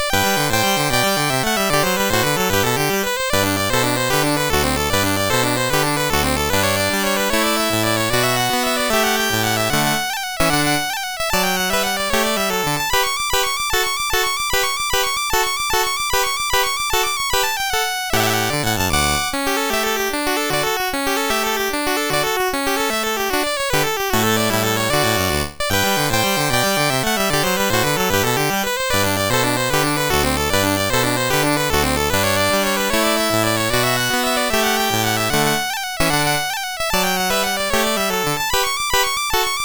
Allegro Vivace in G Major, MOS6581R3

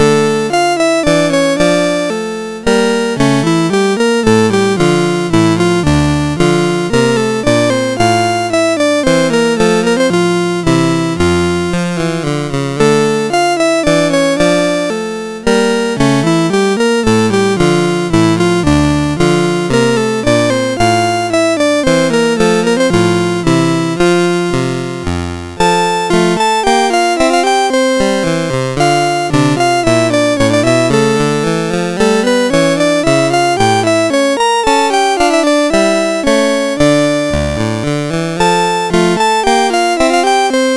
Minuet in D Minor, CSG8580R5

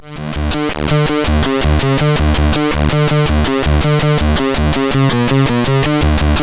sid CSG8580R5; Dungeons of Doom

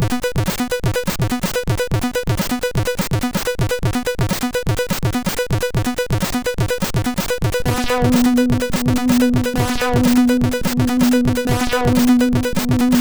Workaholic tune, sid MOS6581R4

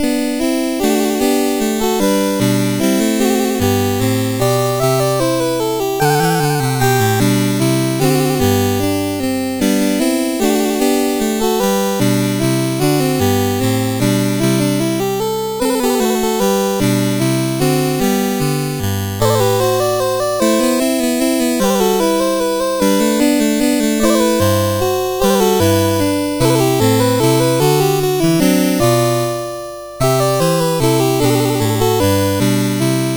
CSG8580; CSG8580R5

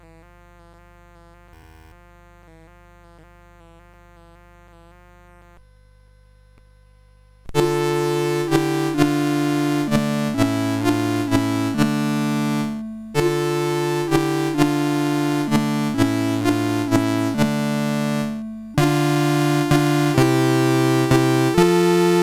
We Three Kings, CSG8580R5

